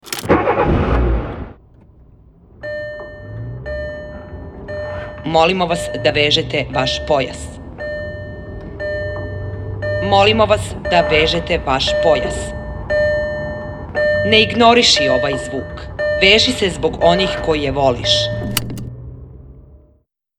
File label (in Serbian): radio džingl